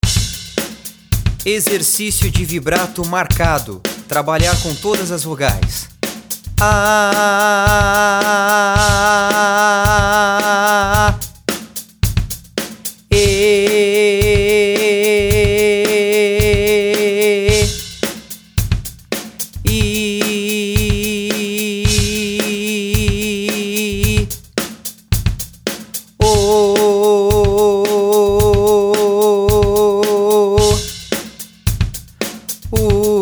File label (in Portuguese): Vibrato Exercício